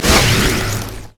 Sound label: crit attack; lissandra base